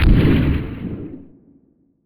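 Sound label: explode distant; hl1 weapon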